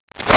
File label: Transition Reverse Surge Reverse; Production Element